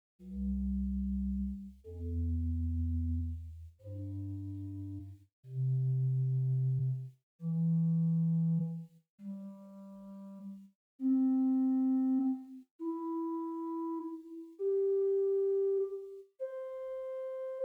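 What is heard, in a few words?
Swell Rohrflute 8 arpeggio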